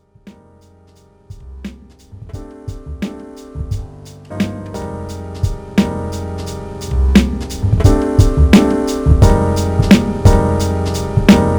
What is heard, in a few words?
SP1200 Dreams Boom Bap